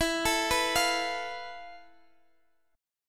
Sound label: F Major 7th Flat 5th E, guitar chord